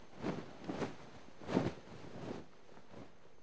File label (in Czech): svleceni; Mafie 1 cz